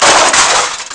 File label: long crash; ST-XX Amiga Soundtracker Sample Packs